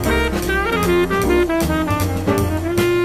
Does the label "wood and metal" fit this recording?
No